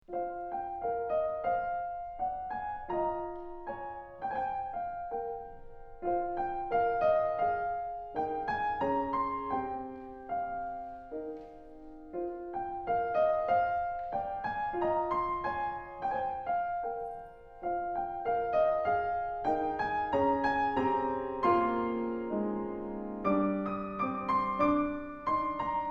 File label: Solo Piano, Celtic Lament